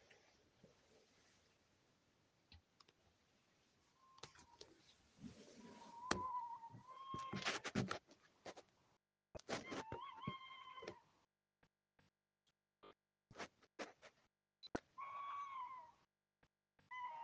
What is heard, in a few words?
Mystery Bird Call